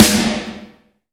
Snare; Vengeance Samples

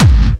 Bassdrums Clubby Kicks; Vengeance Samples

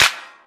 Claps & Snares

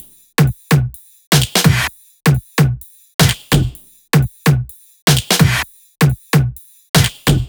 128BPM Big Floor Groove Kit; Vengeance Samples

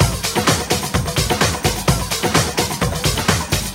Latin House Loop 128 BPM; Vengeance Samples

